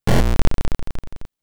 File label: Dying